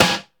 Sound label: noisy snare sound e key